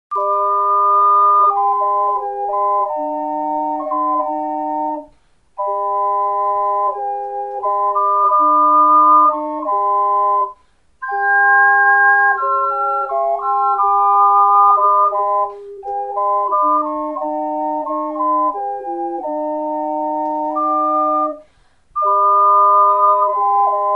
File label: hymn